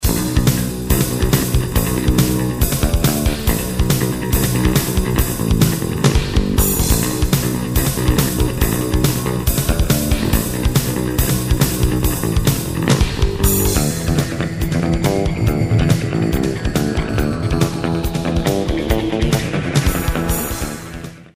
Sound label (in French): basse extrait